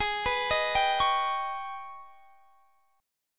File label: Ab Minor Major 11th down; arpeggiated; AbmM11